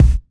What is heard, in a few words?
fat steel kick drum b key, Royality free kickdrum tuned to the B note